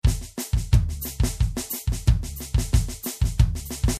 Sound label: batucada caixa with bass drum variation